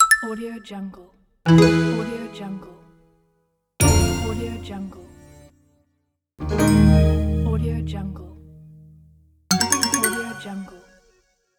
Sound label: gui application menu sound